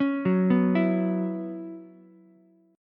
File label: F sharp 7th Suspended 4th, Ukulele; F#7sus4 arpeggiated